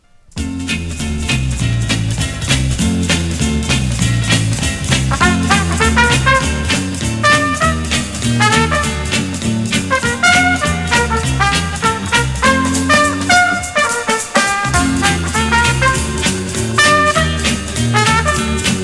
Ambience

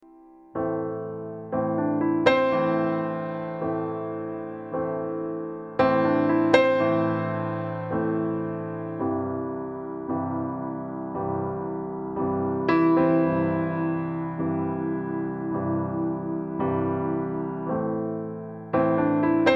Prelude No 8; ВидеоМОНТАЖ 23.0; ams music library

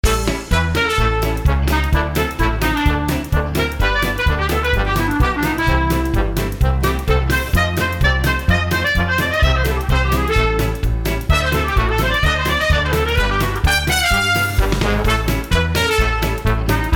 swing; ВидеоМОНТАЖ 23.0; ams music library

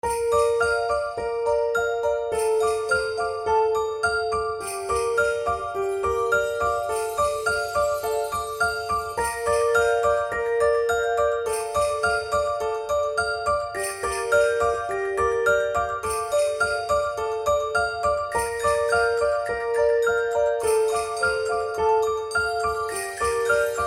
Magical Christmas Loop; ВидеоМОНТАЖ 23.0; ams music library

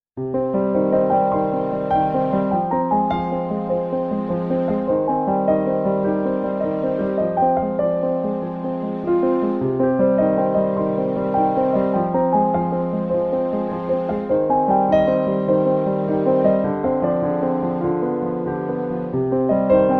classical sadness; ВидеоМОНТАЖ 23.0; ams music library

